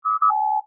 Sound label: input ok clean, computer